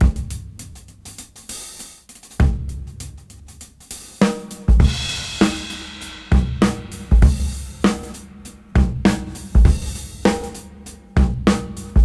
Swing 99 BPM; AMG - Keith LeBlanc Phat Butt Jams

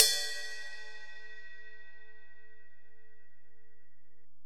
Ride, Alesis DM-PRO

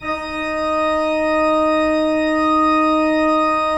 PIPE, 3DOrgans

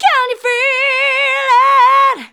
Female vocals and robo-vox

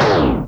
stab; Zero G; Man Machine

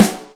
Jely rim, Yamaha DTXpress Acoustic Snare

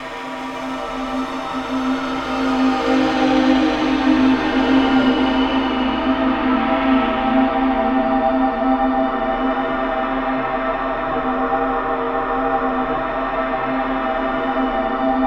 space chill, Textures

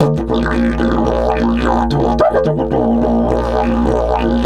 108, DIDGE 108BPM

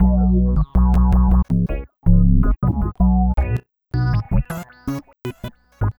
Funk Riff

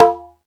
Latin Layers, Drum Machines E-MU Pro-Cussion

